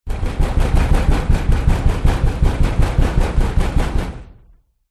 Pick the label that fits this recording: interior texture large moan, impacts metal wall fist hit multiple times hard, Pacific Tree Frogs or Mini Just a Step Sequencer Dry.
impacts metal wall fist hit multiple times hard